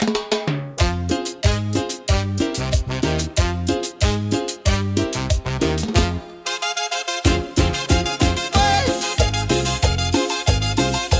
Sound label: cumbia